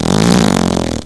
pain; quake2; redux

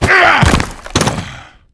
death; quake2; quakeguy

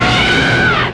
pain; quake2; insect

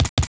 Idle sound, parasite; quake2; stroggdm

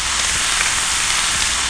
fry, player; quake2; railz

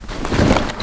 reb on, items; quake2

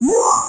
pain; quake2; tourney; EVA-01

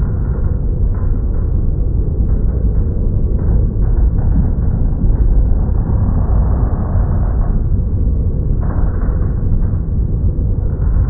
rumble; quake2; coop; slaughter

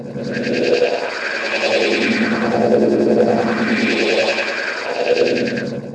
strange; quake2; mnhcoop

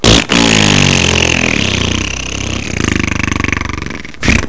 death; quake2; mnhcoop

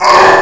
pain; quake2; mnhcoop

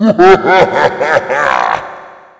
death; quake2; Hueteotl

